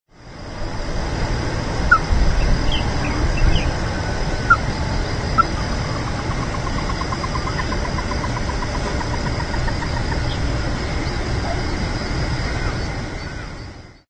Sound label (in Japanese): ryukyuhikuina call